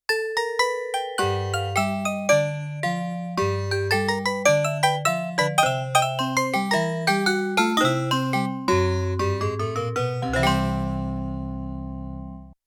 Vibes & Banjo; Yamaha TX16W; Jazz Performance; Jazz Performance 2

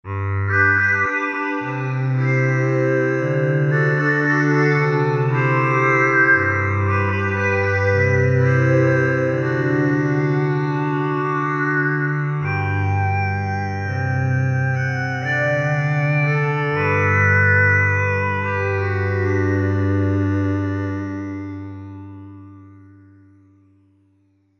2 voices; Korg MSC-12S Synth 3

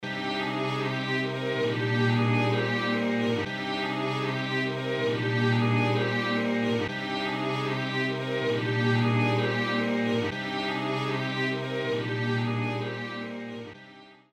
Orchestra Strings; Apple GarageBand 2; Apple Loops

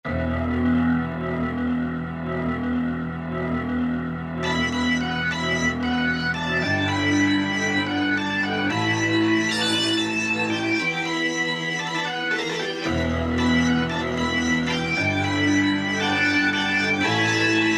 BIGHAMM4 SLW, Old School Keyboards; Universal Sound Bank; Soundscan 09 Old School Keyboards

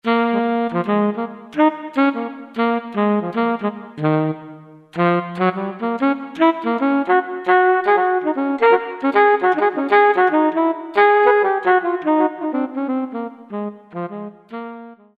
Soft Sax

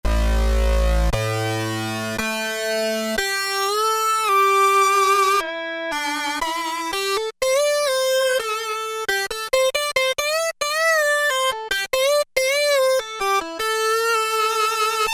Sync Solo, Roland MKS-70

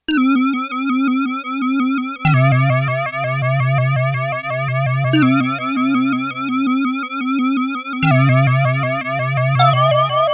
DEEP SEA, Korg DW-8000; Factory Patches

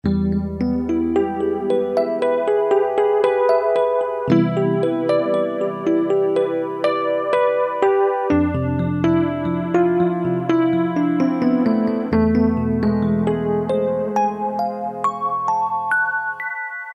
Double E.P, Roland U-110; Factory Patch

